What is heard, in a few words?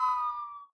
shortfall; Minecraft